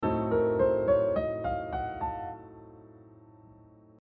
locrian b4 b7 (superlocrian)

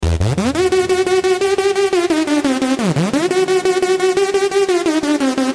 Synth

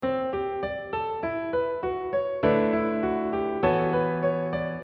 melody line based in 5ths

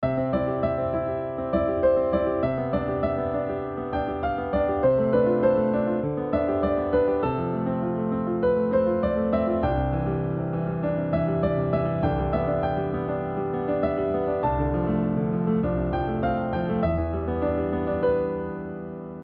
chords + melody + inv + pedal

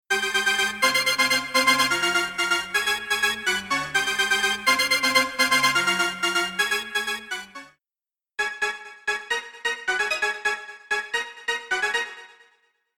Synth stab

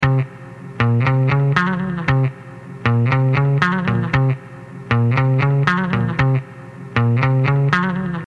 Guitar

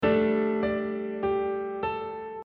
melodic fragment

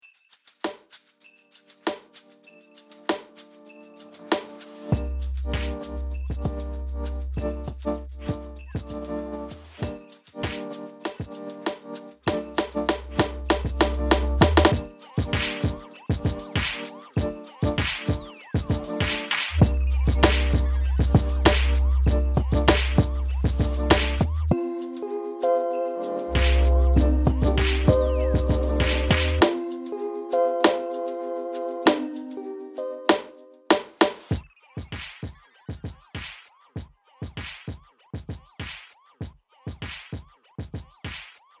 beat

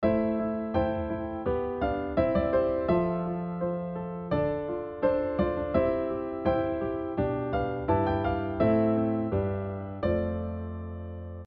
harm mel

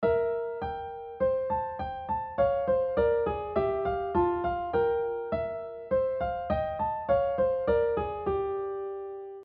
bitonal C and Ab major

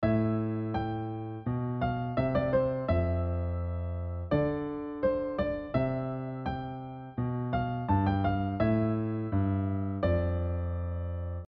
harm mel

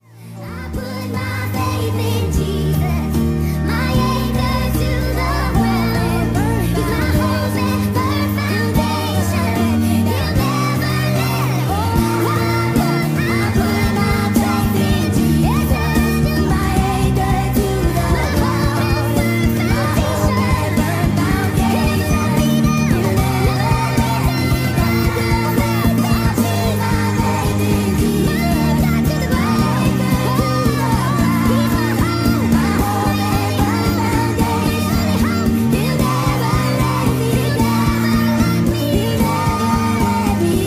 Speed Up

